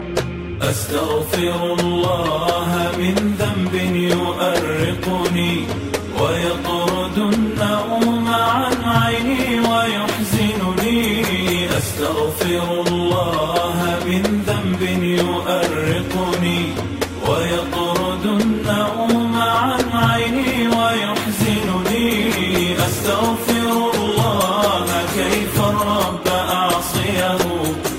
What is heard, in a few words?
Beautiful Nasheed